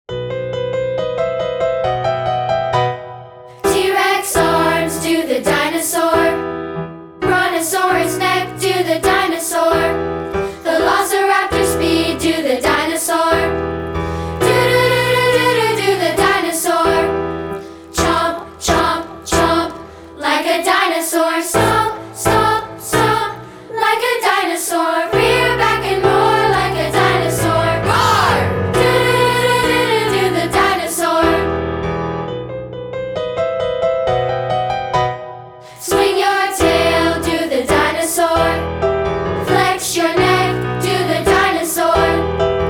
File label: full piano